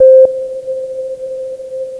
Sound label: beep; GoldSrc